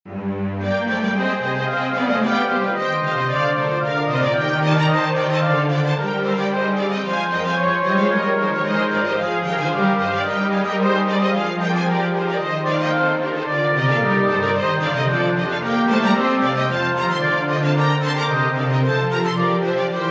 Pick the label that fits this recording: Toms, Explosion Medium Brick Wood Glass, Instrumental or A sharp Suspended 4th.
Instrumental